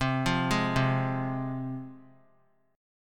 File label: C Suspended 4th Sharp 5th, C, down, chord; Csus4#5 arpeggiated; JGuitar